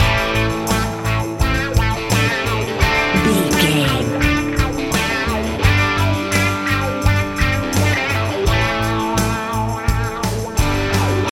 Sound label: dad rock loop